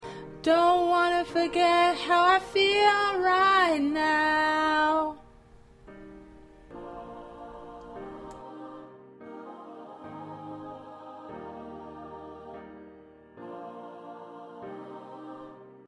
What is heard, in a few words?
Low Lead